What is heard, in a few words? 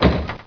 latch unlocked